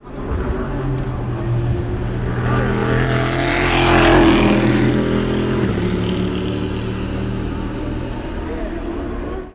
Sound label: panoz tertre rouge